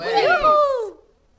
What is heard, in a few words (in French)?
saut skieur masculin